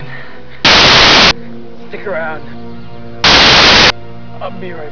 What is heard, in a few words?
stick